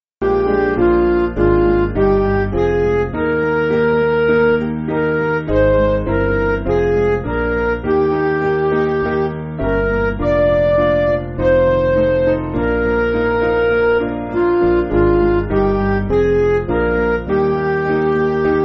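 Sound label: Music Backing Files for Churches; Come Holy Ghost In Love; Pentecost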